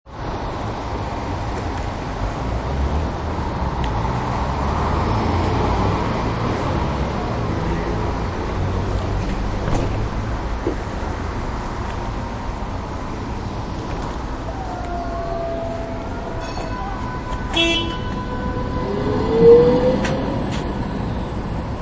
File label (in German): Strassenbahn